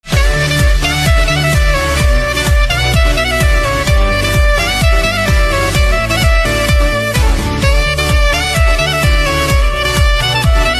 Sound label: Saxophone Instrumental Ringtone